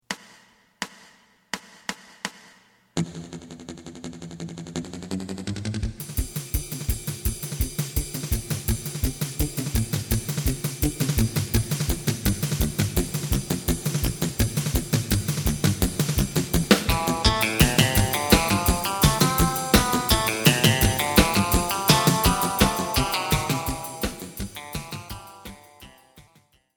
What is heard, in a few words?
bass